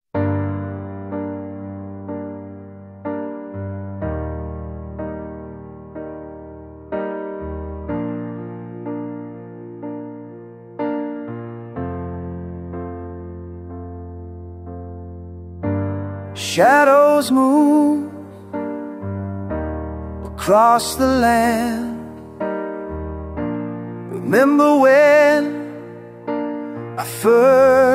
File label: piano version